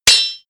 sword hits

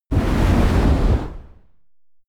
flamethrower short single

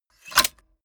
mechanics small snap

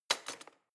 knife wood drawer place hard

